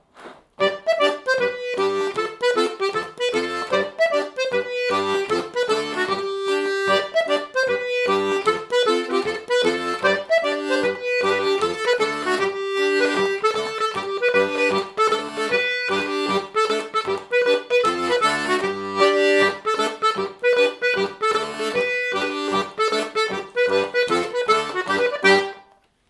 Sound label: Calvignade